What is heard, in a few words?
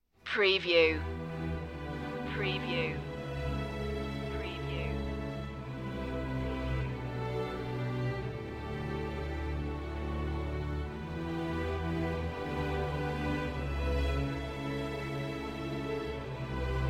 intro part strings and horns